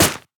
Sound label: Limb Slash